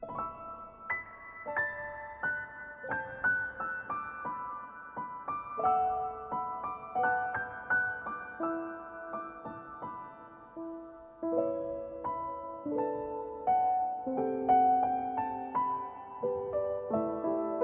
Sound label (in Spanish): orquestal loop; noahmund; berani